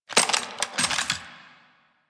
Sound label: Reload, Rifle; Weapons; Warframe; Thanotech; Trumna Prime